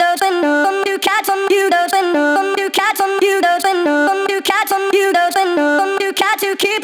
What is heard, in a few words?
Vocal Chop D min 140 BPM